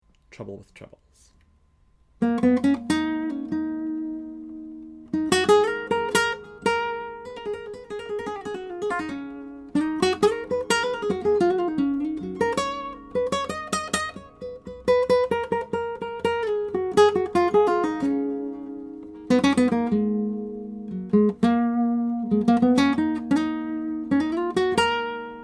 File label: Trebles; Ramirez 1970F